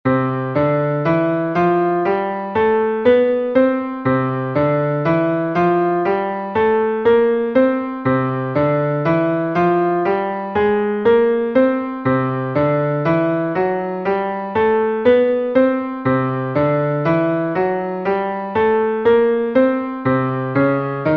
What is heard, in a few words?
Scales Parallel Shapes C